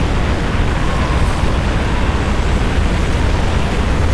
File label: tornado; hl1mp; valve